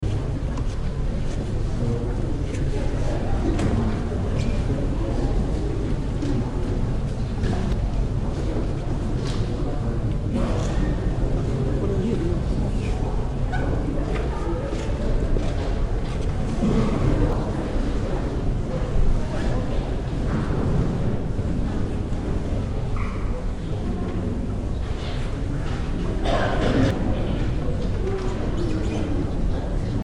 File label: religious german church crowd